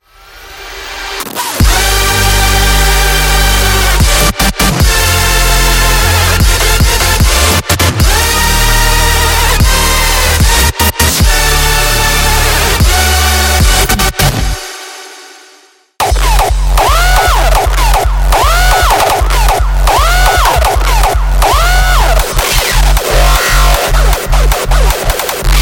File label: Hardstyle Essentials; On Point Samples